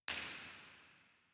Auditorium, Theatres

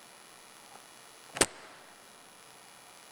Impulsive event

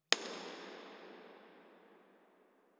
IR sweep; Bergamo; Ricciardi; IMP-RESP

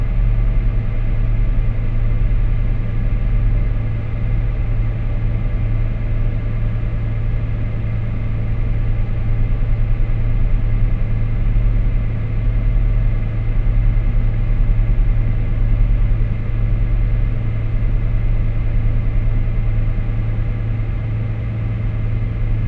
background

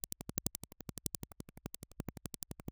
Urban Soundscape; Eigenmike; EM32; ambix; Nevaton

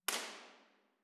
Trieste Left Down, Impulse Responses; Ambiovolver

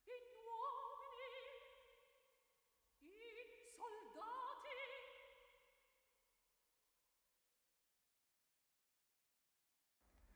Mozart Ambisonics C

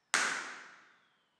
IR classroom

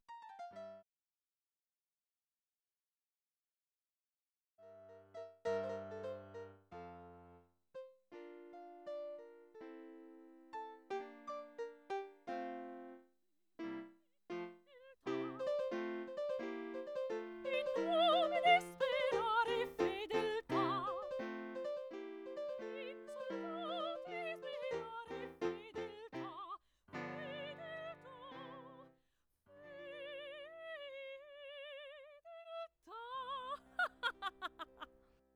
Mozart Panned Both Rs

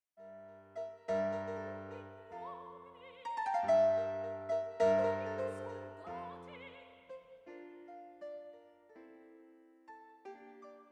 Mozart Ambisonics R

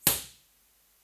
IR

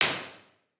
curtain closed

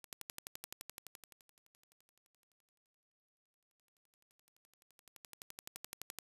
Turntable; rode 50

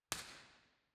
Scala Mono

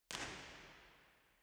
First IR Sala 2800 Genelec Right WY, Soundfield; Santa Cecilia Roma; Measurements 2005

